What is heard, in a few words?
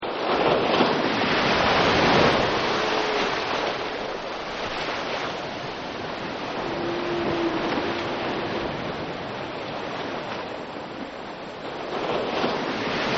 Whales Surf; Animals